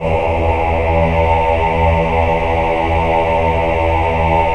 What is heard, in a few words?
VIB E2, VIBRATO, Voices; Spectrasonics